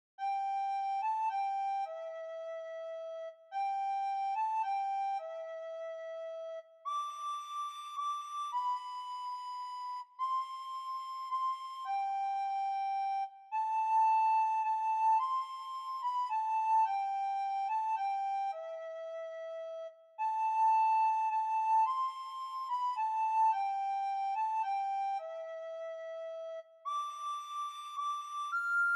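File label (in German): Blockflöte solo; Stille Nacht Heilige Nacht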